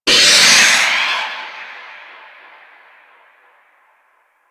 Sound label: Infested Devourer Retract Vocal C; Warframe